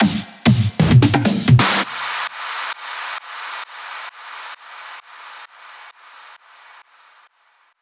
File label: EDM Drum Fill; FL PRO